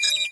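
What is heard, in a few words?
alarm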